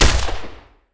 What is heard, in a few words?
varmint rifle